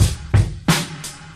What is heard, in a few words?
boring, Drum loops Boombap